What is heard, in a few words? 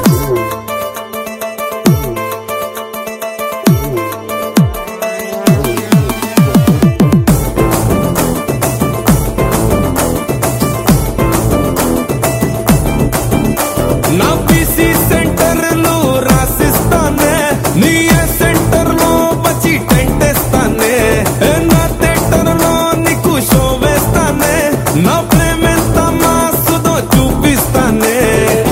Telugu Ringtone